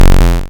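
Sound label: waveform FM square triangle envelope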